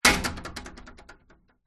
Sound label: household swimming pool door glass close bang wobble